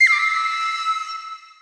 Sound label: quest fail